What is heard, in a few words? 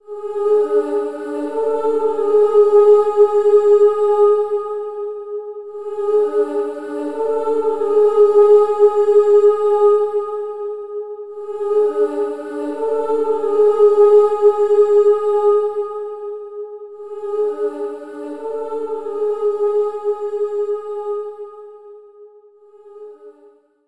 Hymn; The Legend of Zelda Skyward Sword